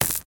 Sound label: taser stun gun zap electricity; SFX; Environment; Guns Weapons; ProSoundCollection v1 3